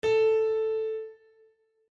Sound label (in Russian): pianino LA